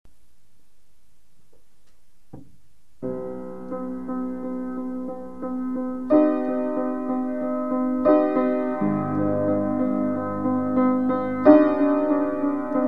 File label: improvisation